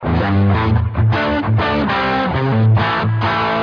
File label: rock